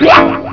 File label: WOAPP; psion cd vol1